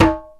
INDIAN, DrumBase; Crypton - DrumBase5000

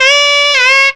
FUNKY SAX; Zero-G; Funky Elements

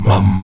VOX MUM F#2A, Afro Chants, Stacc Mums; Roland LCDP11 Africa VOL-1

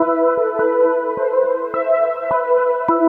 Riff, Synth Sequences

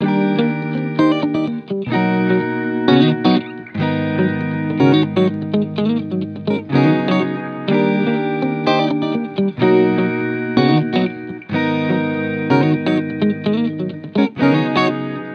Guitar Bpm125 Key D# Full, 70s Guitars Full Mixes; Fox Samples; Back to the 70s Guitars

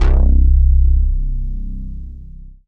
Dance Floor Bass, Multisample Basses

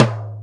R8 Tom, Roland R-8

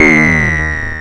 BOSS PERC SNTH, DRUMS PERC

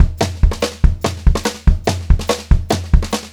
144 SPEED, Pop-Rock Drumloops; USB Soundscan vol.37; AKAI; 90 s Sample CDs

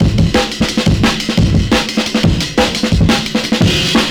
Big Heavy Amen, 175, Breaks; Drum&Bass; EZ Rollers

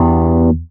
stab roads, stabs

